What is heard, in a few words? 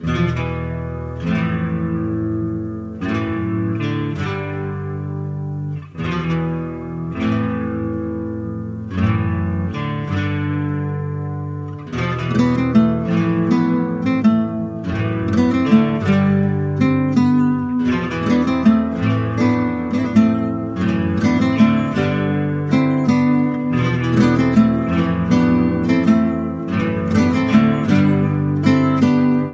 Battery, custom ringtones